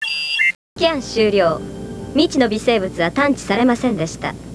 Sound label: Scan Comp